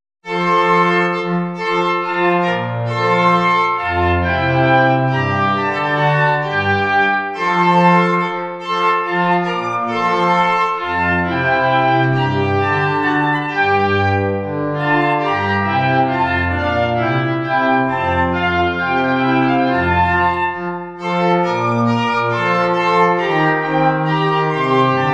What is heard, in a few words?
string